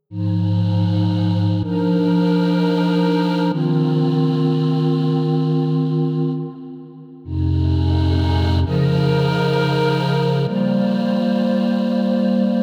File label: synth hybrid series